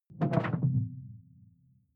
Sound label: sci-fi alien bloom stop; swooshes-swishes